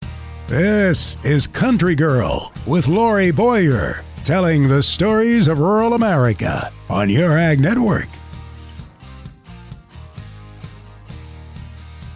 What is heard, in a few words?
Country Girl Intro and Music version long